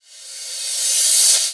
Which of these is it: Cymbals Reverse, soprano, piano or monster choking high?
Cymbals Reverse